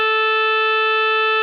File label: opl2